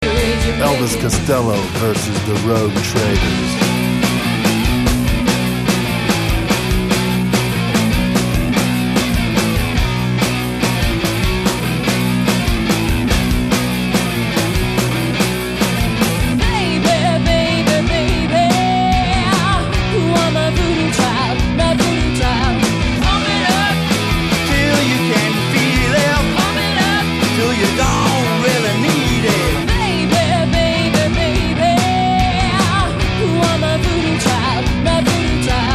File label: pulse